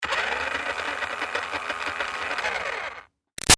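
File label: Toy Bounce Back Racer close up Run Short; Toy Cars; Sound Effects:Toys; Big Room Sound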